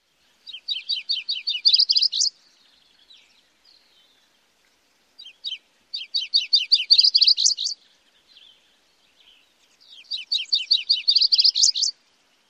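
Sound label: songs, bird; CA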